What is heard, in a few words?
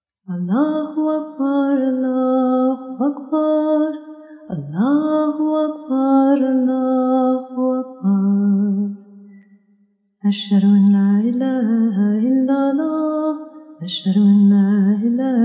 Iqama Private Call To Prayer